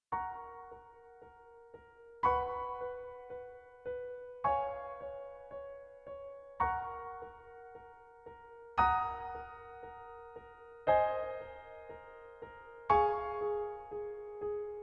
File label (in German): Ave Maria Klavier Variation; Johann S. Bach; Ch. Gounod